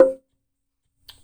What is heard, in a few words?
GATO, Percussion; InVision Interactive; Percussion Slam